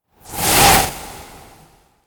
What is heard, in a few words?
sweep